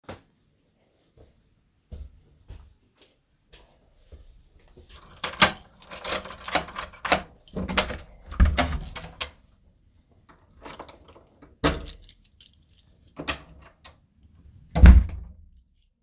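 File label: opening and closing my door with keys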